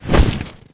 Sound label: MONGOOSE STEP; Emperor - Battle for Dune